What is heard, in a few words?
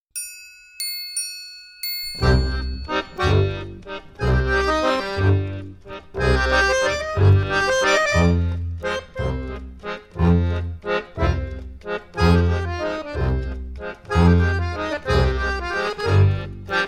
waltz